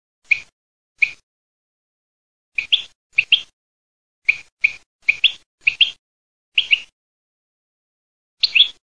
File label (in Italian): CARDEL IN CARD